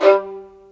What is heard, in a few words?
MARCVLA D2; AKAI S-Series CD-ROM Sound Library VOL-2